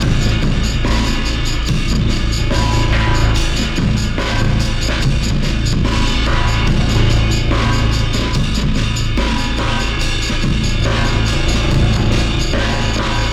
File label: almostfinale drums loop 72bpm; chicory a colorful tale; macos ps4 ps5 switch windows gamerip 2021